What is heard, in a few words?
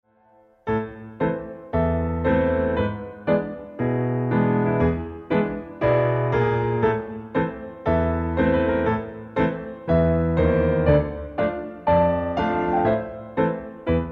Grand battement medium, music for ballet class